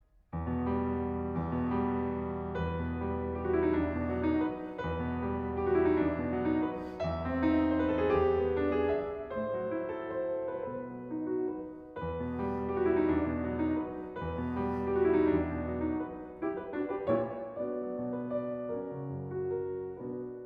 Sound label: Valse triste Arranged for Piano; Leif Ove Andsnes